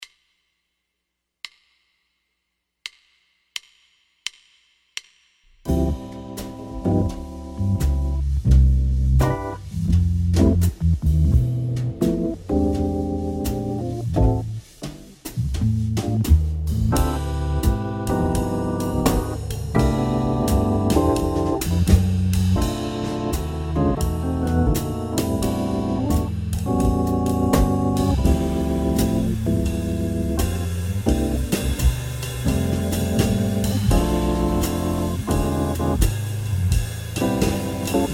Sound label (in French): automnale soundtrack en am, guitare jazz